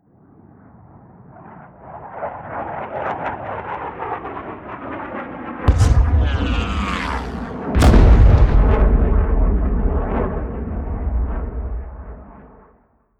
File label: air strike